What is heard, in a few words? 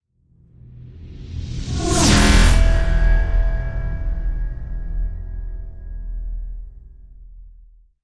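Hit Swoosh Forged Steel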